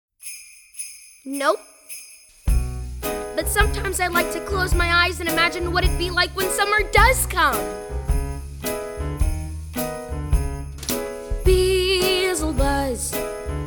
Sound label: VOCALS